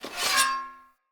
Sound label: Great Sword Equip; Weapons; Swords; Dendrit Great Sword